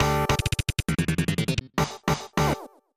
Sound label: Clear Level; Kablooey; SNES; JPN Version